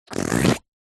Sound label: cartoon squeeze pull squeak rubbery squelch